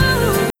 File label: Rock